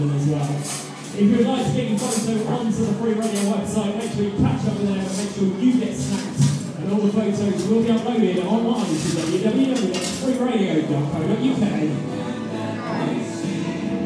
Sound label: build up to Christmas light switch on, too loud; in crowngate